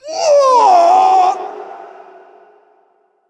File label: Death; Creature; Bolvar Fordragon